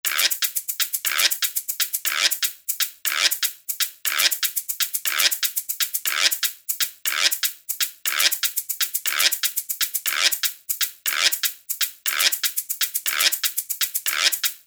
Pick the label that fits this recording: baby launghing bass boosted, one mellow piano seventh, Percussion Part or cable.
Percussion Part